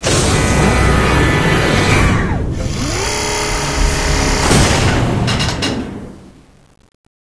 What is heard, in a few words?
door close; dm powerstruggle